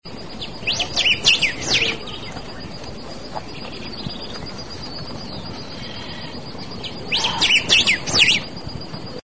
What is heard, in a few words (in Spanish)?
voz pepitero de collar